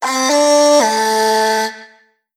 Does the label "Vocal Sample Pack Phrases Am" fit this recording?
Yes